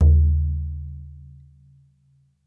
BODHRAN; E-MU Producer Series Vol. 7 – Old World Instruments

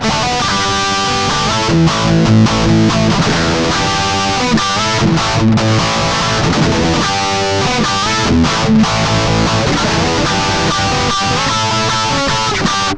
Guitar Licks

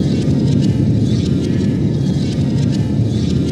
LASER GUID; Distored Reality

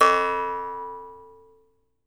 Jaw Harps; E-MU Producer Series Vol. 7 – Old World Instruments

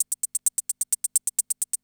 CR78

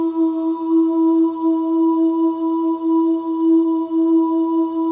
BGV OOS; Spectrasonics; Symphony of Voices

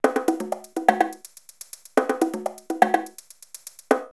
727 124, drumloops, DrumMachines; X-Static Goldmine

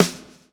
lash, backbeat

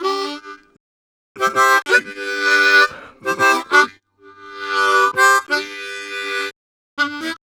Harm 129bpm G, Harmonica

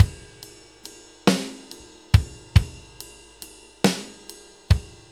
6/8 Loop, Drum Tools, Laid Back

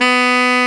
SAX TENOR, Super Sax Tenor mf

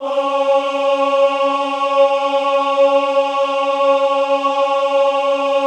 AHH C3, choir; Best Service; Extended Classical Choir; AHH MIX

